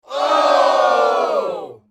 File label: Boos; stoneage